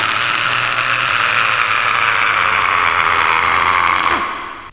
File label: aarrghh